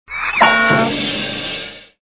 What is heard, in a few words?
Bonk